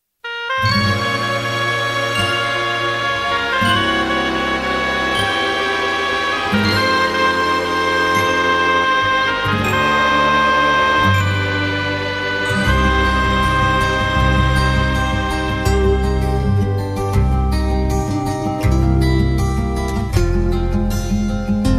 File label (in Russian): Minusovka